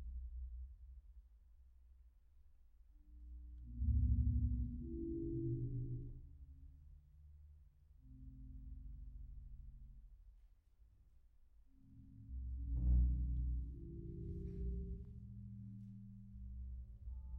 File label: concert ambisonics